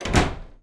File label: door close